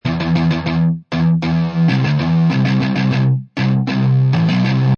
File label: Electric Guitar Riff2 Loop Em 98Bpm, Electric Guitar Loop In E Minor At 98Bpm; Guitar Loops